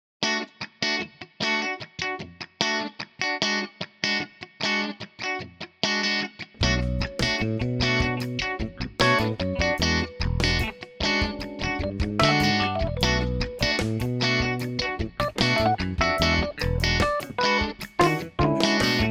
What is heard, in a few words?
Funky Scratchy